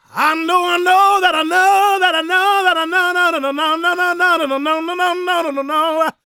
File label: gospel male, vocal; ILIO; Vocal Planet